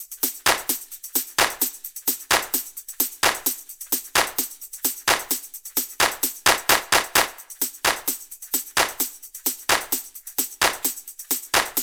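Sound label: 130 top clap tambourine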